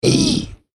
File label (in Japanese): クリーチャー ステレオ